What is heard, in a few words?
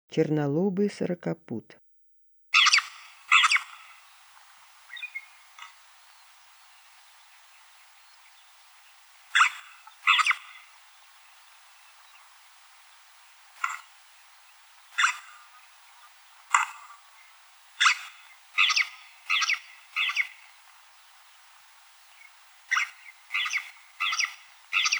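lesser grey shrike voice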